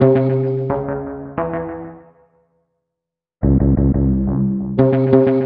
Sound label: String Tone; Sonic Foundry (Sony Creative Software); Stylus Pressure Urban Grooves on Digital Wax